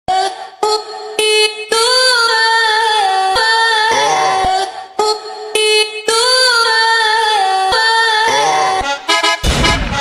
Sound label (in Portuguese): funk do bounce